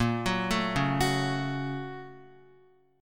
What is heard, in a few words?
Bb Minor Major 7th Sharp 5th, Guitar; x,1,4,2,1,1; arpeggiated; 4E,4A,5D,5G,5C,6F; chord shape